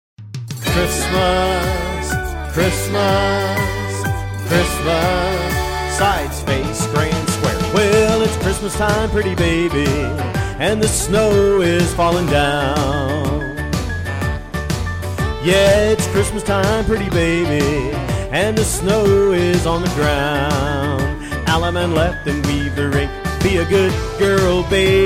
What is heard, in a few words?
vocal